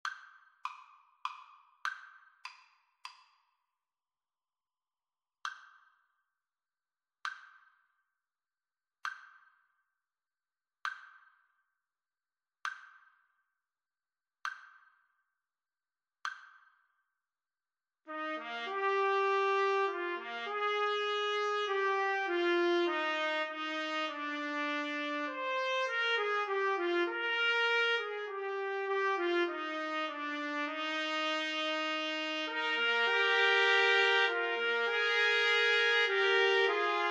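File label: trumpet trio, karaoke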